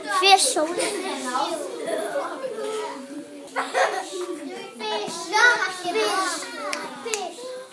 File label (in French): enfants ecole